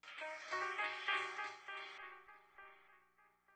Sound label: Stab; Thirty-One Flavors